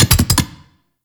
Wall Climb Cast Step Twirl; Warframe; Oraxia

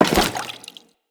Crewman Step Water, Footsteps; Enemies; Corpus

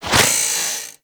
Worker Grinder Whoosh; Warframe; Grineer

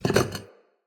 Vase Medium Slide; Vases

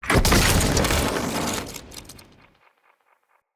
Energy Spike Ground Slam

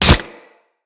Melee Impact Flesh; Kubrow

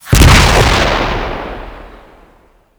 Swarm Missile Explosion; Warframe; Swarm Missile Launcher

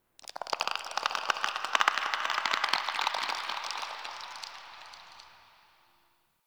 Tendon Stretch Large Distant; Ambience; Misc Outdoor; Warframe; Infested Eris

